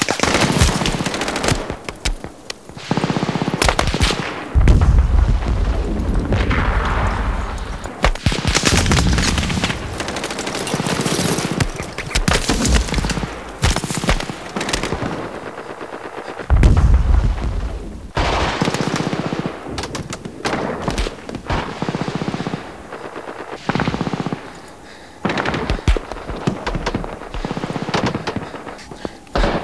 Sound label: ambience